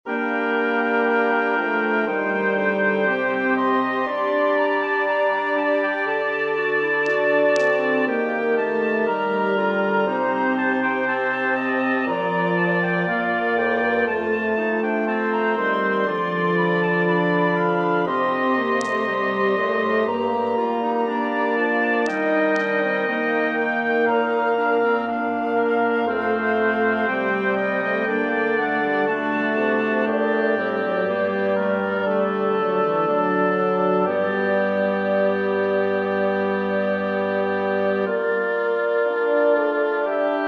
pavan pour quintette vent; magatagan mike; sur notes

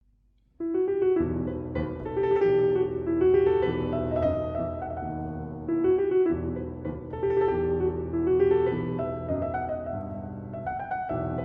tambourin gavotte; Rameau, Jean-Philippe

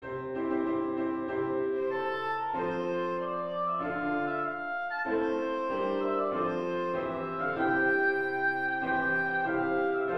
hymne national de Belgique

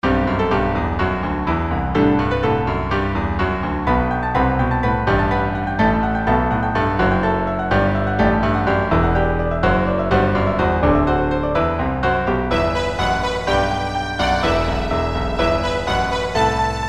sonate pour violon et piano en mi mineur

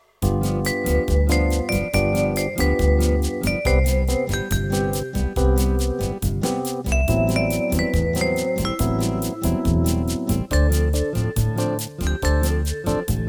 bossa

